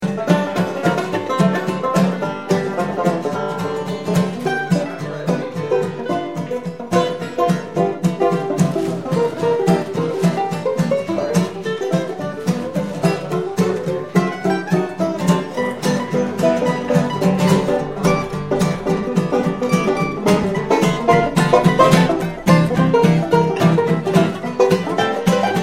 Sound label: Bluegrass Stomp